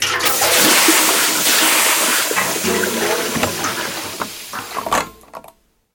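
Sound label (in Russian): наполнение бочки бензина на автозаправке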